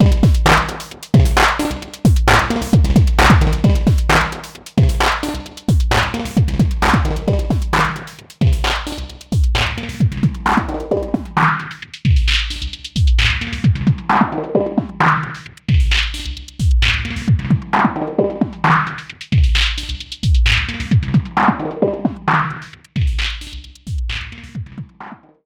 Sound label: Bandpass Panner